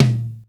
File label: Toms, Real